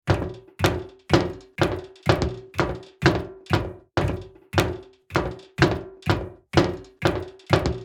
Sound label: Footsteps on metal ship deck fast walk